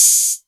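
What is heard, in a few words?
808 Hat Open; Novation Drumstation; Novation DrumstationV1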